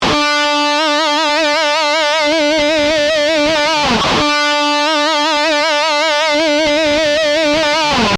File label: Vibrato Example, guitar